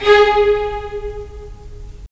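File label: strings violins, left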